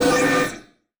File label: Multimedia Internet CD-Rom Flash Wipe